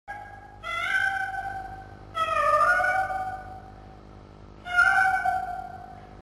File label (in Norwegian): Katten Miaaooou